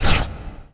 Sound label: Lightning Rip Sweetener; Sony Pictures Sound Effects Series Volumes 1-10; Sony Creative Software